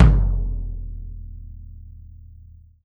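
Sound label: bass drum open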